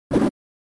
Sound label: Gen Fighting Swing